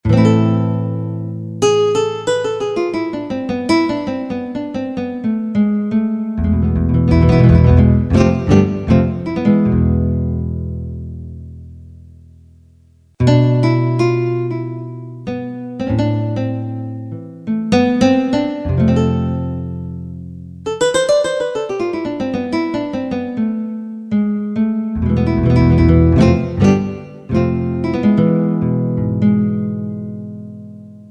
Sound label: Class Gtr; Yamaha YPT-400; Voices